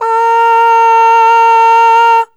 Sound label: Aah Short A#3 Dry